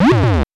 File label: POW Block; Super Smash Bros